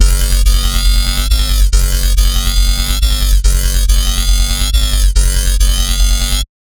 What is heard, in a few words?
Bassline A2 140, Dubstep; GhostHack